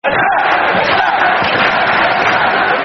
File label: Attack, Attack, Football Chants; Chelsea Cfc